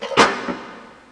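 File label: hi pedal; drums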